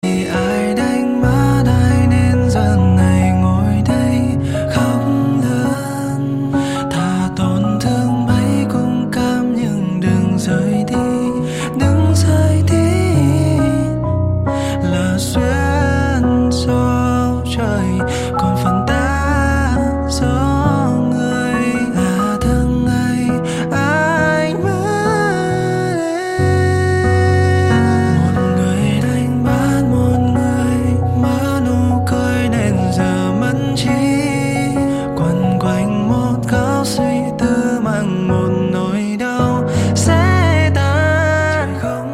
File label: piano cover